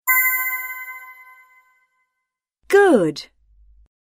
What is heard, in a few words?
Correct